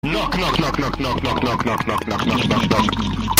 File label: knock knock